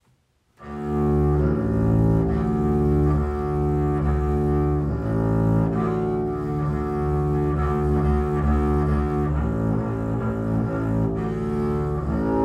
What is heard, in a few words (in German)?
arco Bass